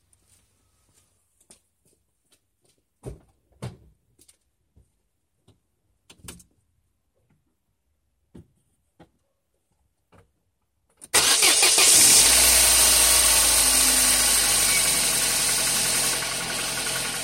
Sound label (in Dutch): tikken, corsa c 1.2 tikkend geluid